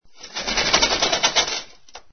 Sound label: WINDOW